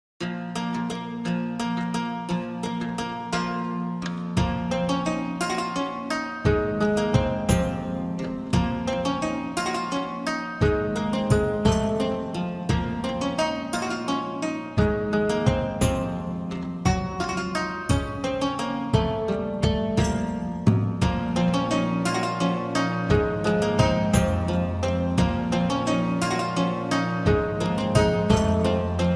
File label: Ye Old Tavern Music - Medieval Fantasy Background Music